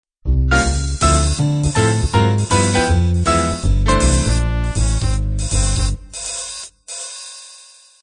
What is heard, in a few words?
up swing intro